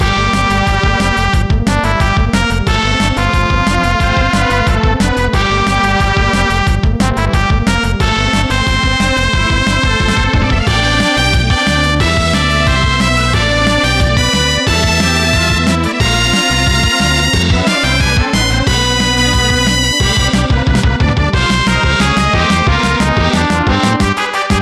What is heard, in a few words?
battle; SNES